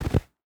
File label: footstep burlap snow jump land; Rust